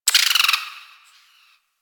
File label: Forest Distant Medium Creature Tree Small Call; Warframe; Grineer Forest